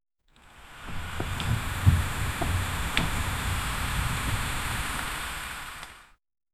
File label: pushing wall, friction